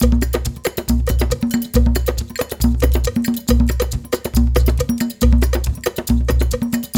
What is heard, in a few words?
138, Gyana HiLife; Spectrasonics; Supreme Beats; African Contemporary